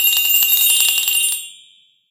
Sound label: Powerup Counter Sparkle; angry birds